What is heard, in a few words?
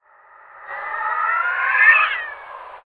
cry cats reverse